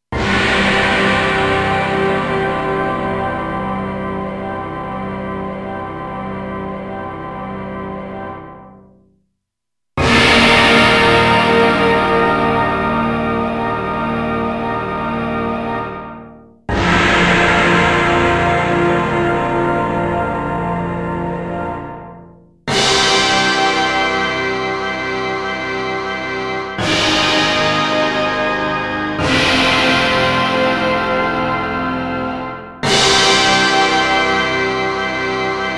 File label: Orch Hit